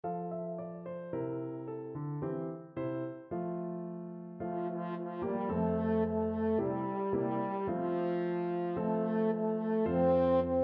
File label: french horn